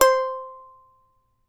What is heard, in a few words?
charango C4, guitars; Spectrasonics; Hans Zimmer Guitars VOL-2